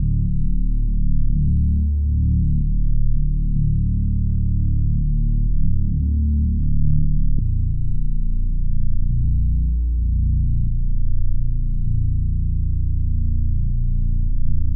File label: 65 BPM D Min Bass; Loop Stems; Cymatics; Perfect Timing